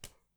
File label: card play